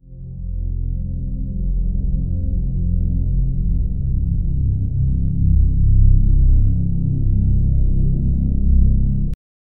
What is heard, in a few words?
dark cinematic ambient music